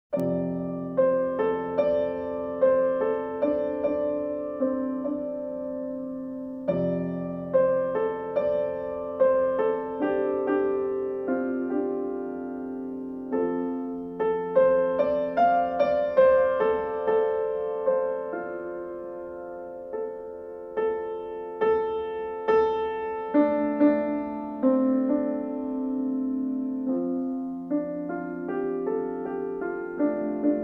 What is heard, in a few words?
piano